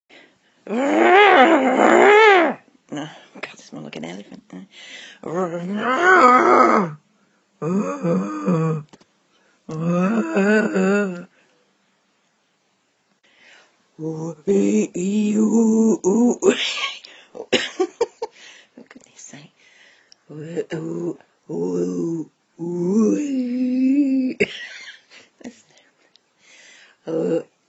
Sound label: Failed attempts to make a wookie sound